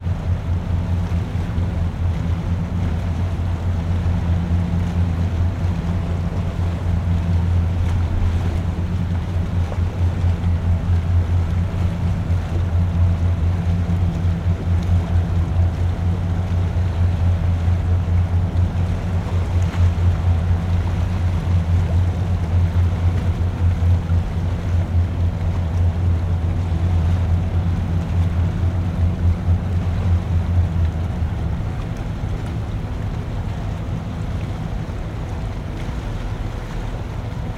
industrial port ambience waves lapping distant hum ships in bg